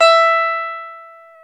GTR E12, Ac 12+ E.12; Club-50 - Foundations Roland; Dual Guitar

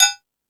Space Drums, Drum Machines

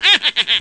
hahahaha; ST-XX Amiga Soundtracker Sample Packs

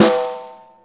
Ring Snare, Snare One-Shots; Drum n Bass; KJ Sawka; Drum n Bass & Beyond; Drum Tool Box

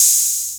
THE 808, ROLAND, DRUMS + PERC; Rubber Chicken Software Sample Bank

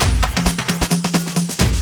Groove 132; Dance Mega Add On Grooves

